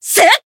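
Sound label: Voice attack, Japanese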